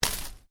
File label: Foliage hit soft, Foliage Impact, Soft Foliage Hit; Miscellaneous Impact